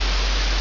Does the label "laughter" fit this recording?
No